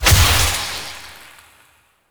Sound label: Projectile Death Medium; Weapons; Warframe; Duviri; Incarnon Adapters